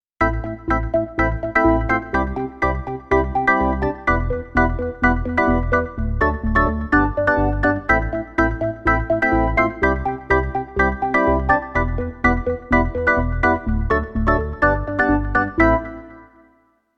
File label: Dance Organ 90s